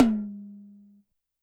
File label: Tom Soft, YAMAHA RM1X